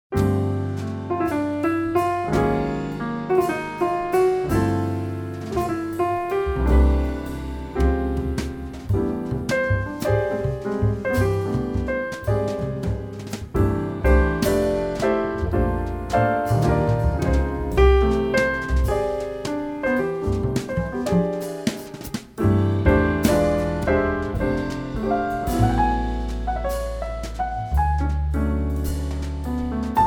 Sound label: Waltz